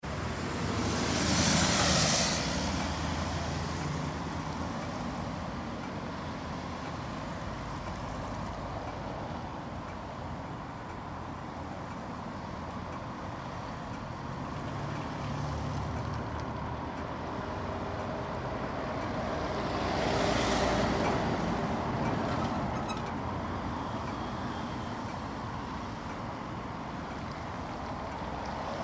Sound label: Traffic Crossing Heavy Traffic, Traffic crossing with audible crossing signal; for the visually impaired. Moderately heavy traffic in city traffi; Traffic And Car Sound Effects